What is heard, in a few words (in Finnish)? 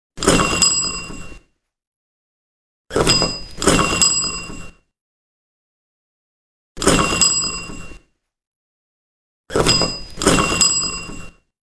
ovikello vanha